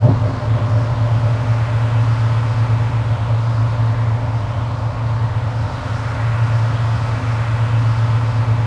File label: ATMOPAD; Best Service; XX Large; Pads